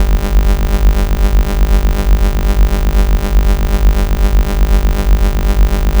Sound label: Tone; Generated Tones; a sounds adventure through the intranet